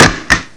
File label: slot Into Place